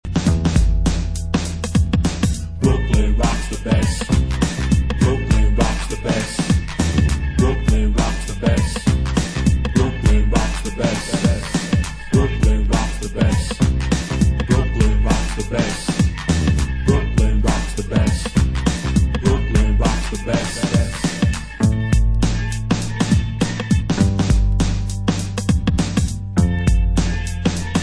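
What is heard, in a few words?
beat box instrumental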